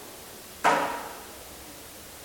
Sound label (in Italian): Colpo forte; Castello Marostica